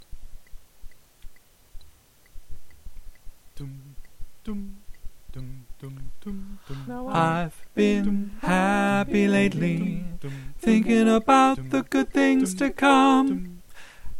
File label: tenor